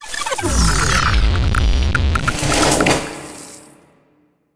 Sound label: ui quit game; Rockets Rockets Rockets